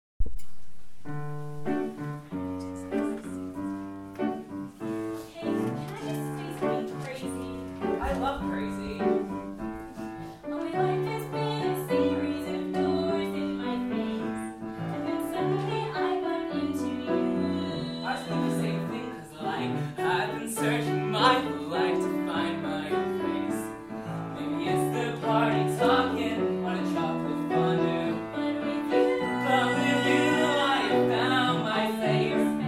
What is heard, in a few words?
duet